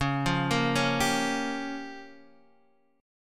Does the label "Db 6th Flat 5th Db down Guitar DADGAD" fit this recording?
Yes